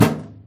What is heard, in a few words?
metal computer impact soft; physics